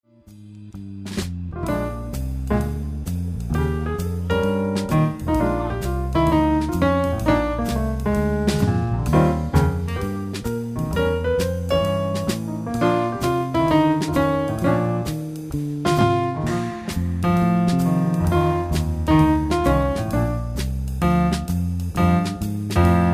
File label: Quintet, jazz band